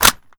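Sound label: weapon foley assault rifle reload empty mag in; gmod; viper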